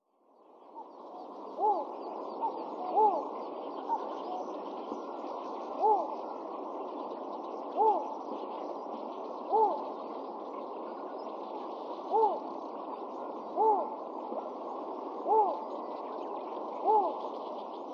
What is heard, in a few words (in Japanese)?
yoshigoi; watarase